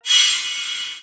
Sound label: Ingame Score Plus